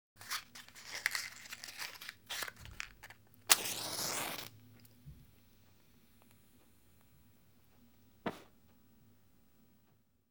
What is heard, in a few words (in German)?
streichholz wird angezuendet und ausgeblasen; geraeusche digital